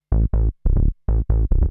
TECHNO BASS 140 A

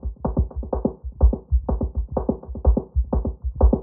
125 Grooves, Percussion; Loops; Percussion (Stereo); Touch Loops; ElementsOne; Abstract Rhythms

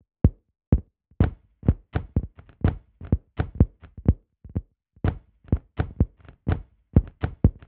125 Grooves, Percussion; Loops; Percussion (Mono); Touch Loops; ElementsOne; AbstractRhythms

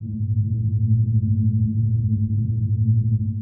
TEKNO 140; Techno2

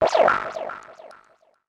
FX SHOT TEKNO 140; Techno2; Singleshots

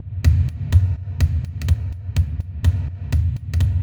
125 Atmosphere, Percussion (Mono); Touch Loops; ElementsOne; AbstractRhythms

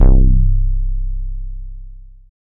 BASS MONO

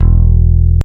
FINGER E-2, ELECTRIC BASS

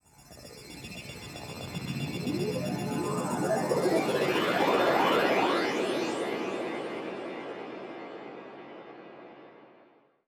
TECHNO FX 140

